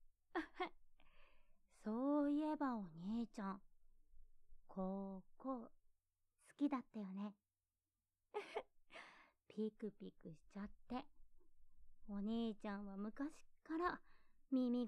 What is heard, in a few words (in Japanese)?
耳舐めと囁き, ASMR